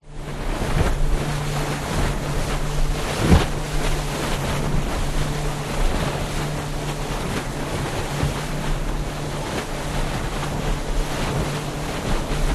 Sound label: transport motorboat outboard 16 ft fiberglass drive fast sea slightly choppy side